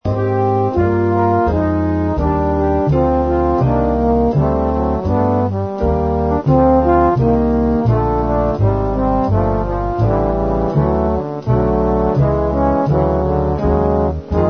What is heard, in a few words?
trombones